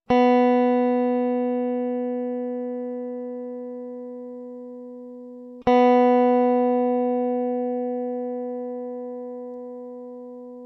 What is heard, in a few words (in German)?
Stimmton h